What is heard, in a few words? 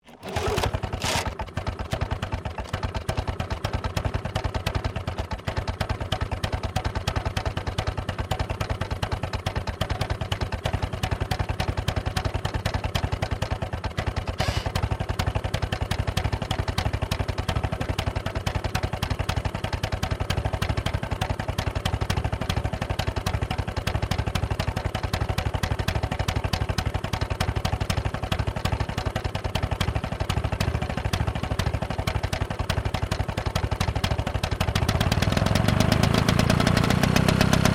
LAWN MOWER START LOW IDLE TO HIGH IDLE, Old lawn mower; Victor; Machines